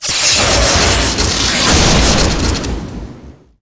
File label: Electric shock